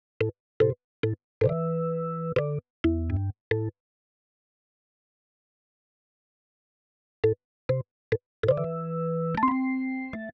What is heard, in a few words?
reggae organ loop